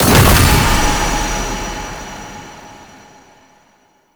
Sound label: Hazard Hero Target Break; Warframe; Duviri; Undercroft Hazards